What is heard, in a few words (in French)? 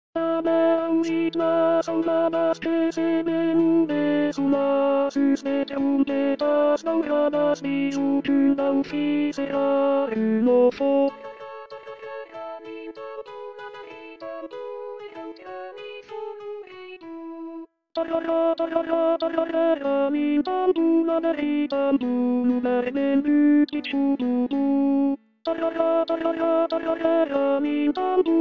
nadal tindaire t